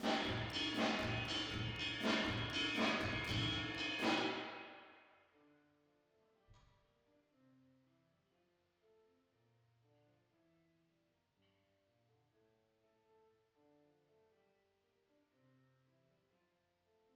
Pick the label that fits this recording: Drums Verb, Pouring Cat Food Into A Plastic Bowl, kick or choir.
Drums Verb